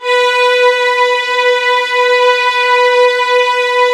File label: LDFV B4, LOUD VIOLINS; Q Up Arts; Denny Jaeger Master Violin Library